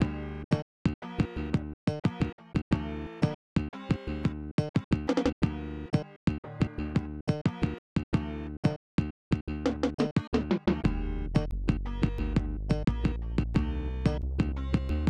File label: Major Boss Battle; warpspeed 1992 snes